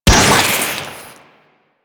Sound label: Infested Beam Pistol Shot A; Warframe; Weapons